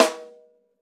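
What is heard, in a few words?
RAW snare drum, Drums Acoustic; AKAI; 90s sample CD; USB Soundscan vol.10; RAW KIT 5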